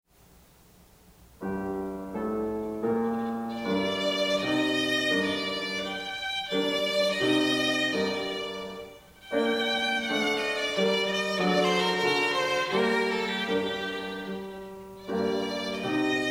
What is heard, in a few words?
Violin Sonata in G Minor, Affectuoso, LIVE; Harumi Furuya Violin; Tartini